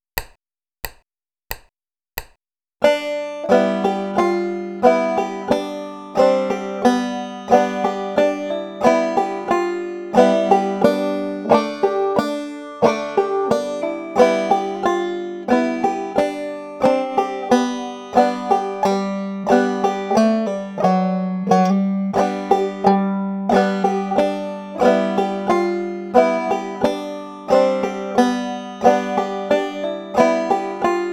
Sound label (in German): old joe clark mit hammer on pull off und slide